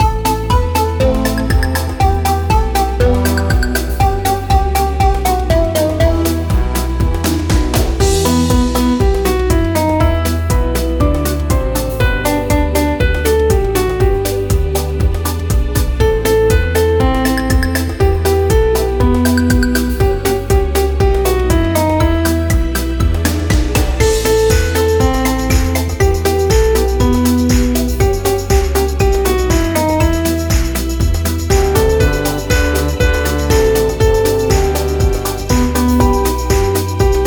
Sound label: karaoke